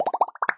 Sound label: Bowling ball